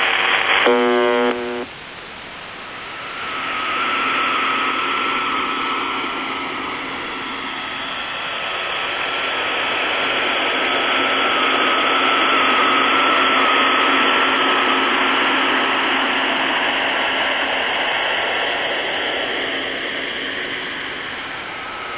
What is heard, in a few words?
buzzer usb marker off